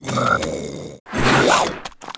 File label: Croaker attack